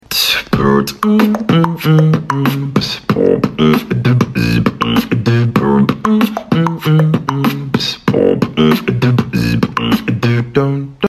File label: Beatboxing vibes